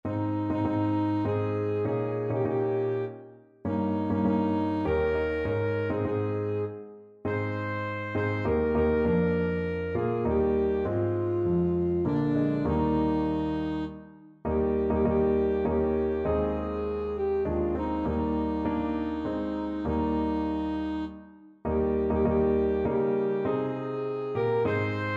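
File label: alto saxophone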